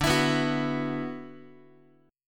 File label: D 6th Add 9th D, Guitar; D6add9 strummed; x,10,7,9,10,x; JGuitar